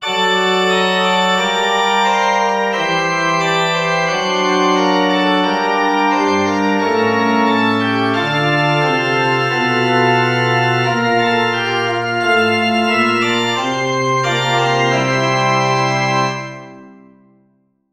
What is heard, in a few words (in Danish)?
forspil; du som har tændt